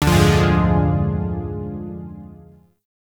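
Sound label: Shawzin Minor Chord B; Zariman Shawzin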